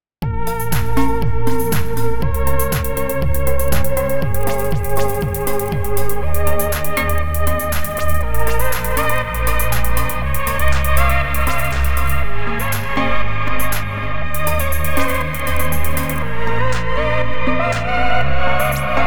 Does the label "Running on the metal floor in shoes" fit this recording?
No